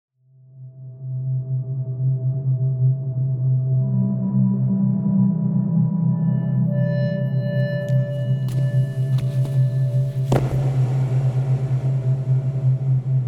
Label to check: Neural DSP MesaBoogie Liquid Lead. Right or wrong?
Wrong